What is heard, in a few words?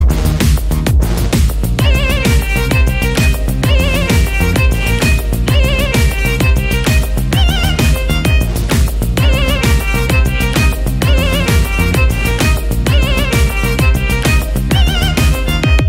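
SONG background music RINGTONE, INSTRUMENTAL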